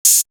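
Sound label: Hi hat, Drum One Shots; AMG - Coldcut's Kleptomania VOL-1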